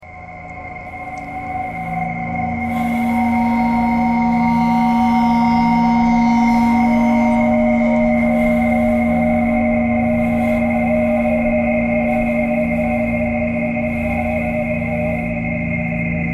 traffic kcore birds a ice bowed vibes thread simple; bowed metal